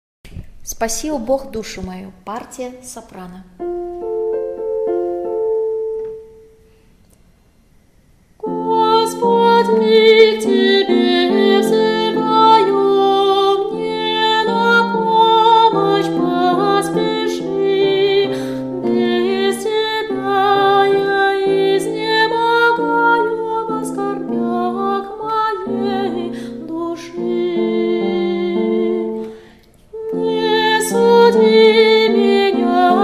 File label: soprano; Gospodi k Tebe vzyvaju